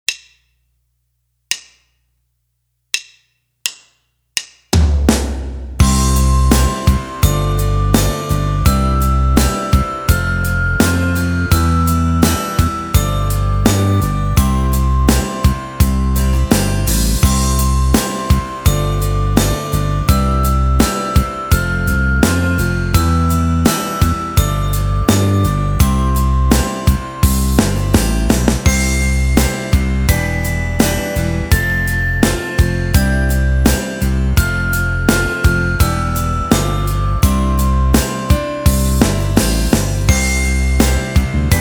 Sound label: glockenspiel